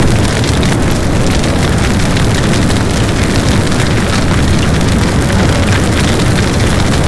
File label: tank fire